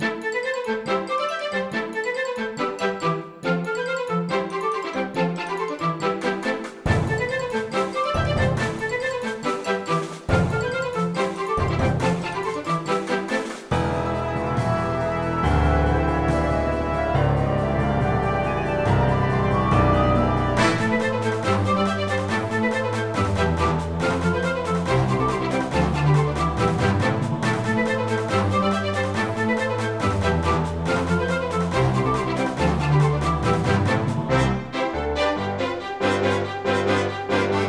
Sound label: Orchestra